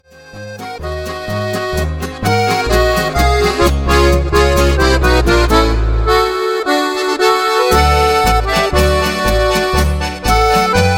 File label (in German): olma hit medley